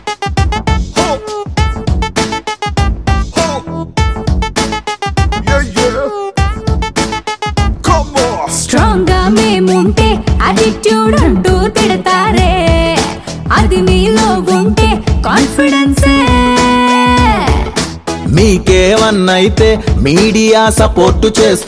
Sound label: telugu music song ringtone